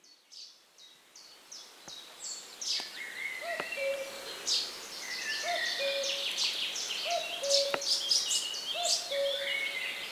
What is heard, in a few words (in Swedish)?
gök, fågelljud